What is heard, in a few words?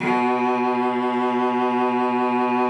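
B2, cello vibrato; Xsample VOL-7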